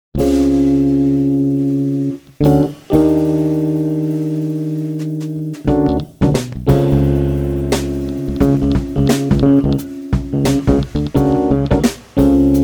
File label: brush